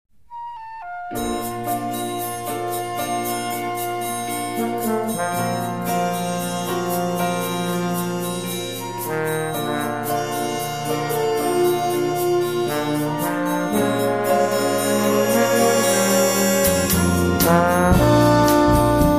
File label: jazz